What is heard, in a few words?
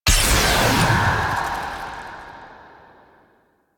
Exalted Blade Activate; Warframe; Warframes; Excalibur Umbra